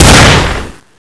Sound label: DE fire, weapons; firearms